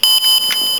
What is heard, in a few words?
beep